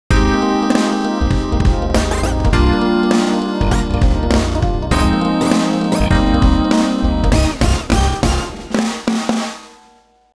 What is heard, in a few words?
Pop; WSOLA; TSMtoolbox